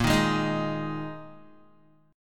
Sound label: A sharp 7th Suspended 4th down Guitar 4F 4A 5C 5G 5C 6E; strummed; JGuitar